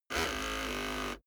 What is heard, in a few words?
servo steady